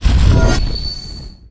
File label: skill ignite cast